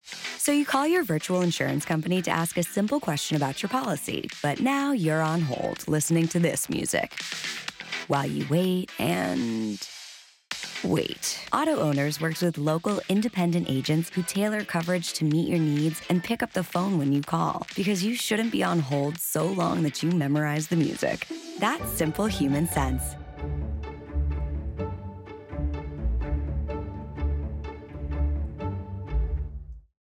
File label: Bad Hold Music; iHeartRadio